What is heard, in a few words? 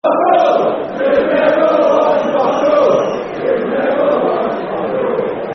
You've Never Won Fuck All, Football Chants; fanchants; Ipswich Town Itfc